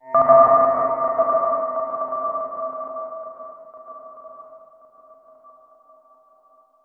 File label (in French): Morse Monte Descente